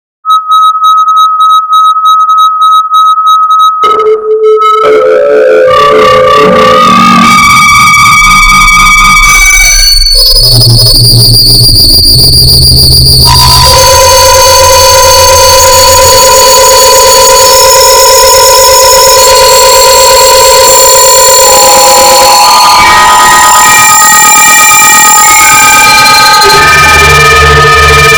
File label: noise